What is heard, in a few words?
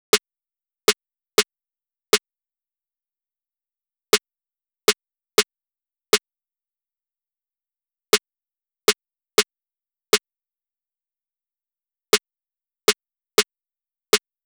909 Snare 120 Bpm